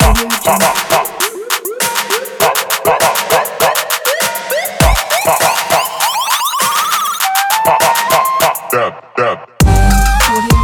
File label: flute trap beat